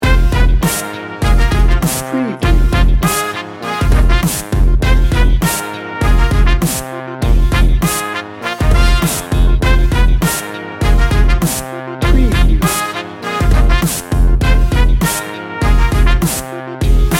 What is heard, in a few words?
new orleans street party loop